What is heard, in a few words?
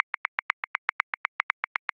Disco Live Feel 16ths; Grooves; Ableton Live 12 Lite; Core Library